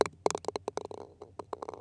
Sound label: golf cup; ProStroke Golf World Tour 2007